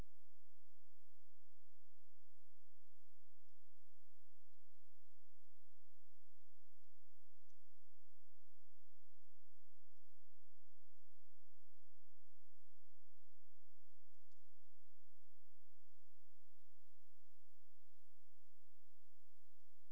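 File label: hydrophone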